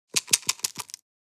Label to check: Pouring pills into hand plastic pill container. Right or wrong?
Right